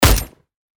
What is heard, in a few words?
weapon; VSS